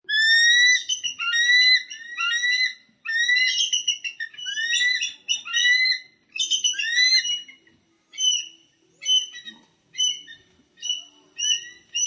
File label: One Eagle And One Vulture Squawking Excitedly